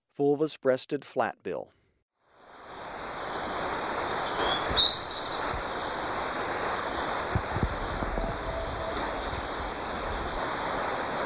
Fulvous-breasted Flatbill, Birds of Ecuador; S. America

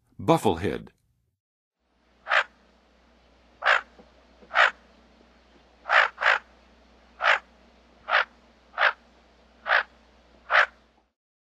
Bufflehead; North America; Western USA; Western USA Songbirds

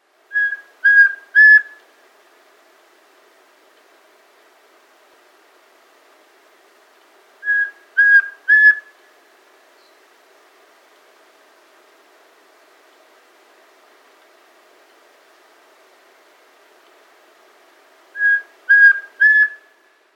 Rusty-Tinged Antpitta; S. America

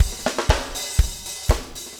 4 on floor 120, loops, 120bpm

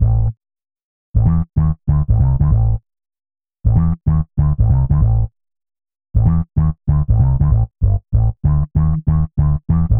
Hi Hat Jazz, 96; Hip-Hop

